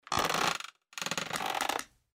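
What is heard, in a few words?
sel i skrip, sat down and creak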